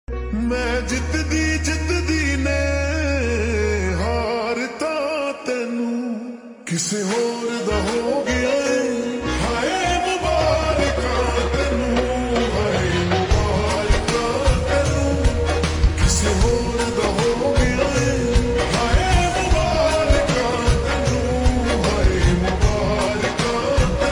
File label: Ringtone Slowed Reverb